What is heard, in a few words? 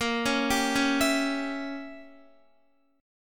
A sharp Diminished 7th Guitar, chord; arpeggiated; JGuitar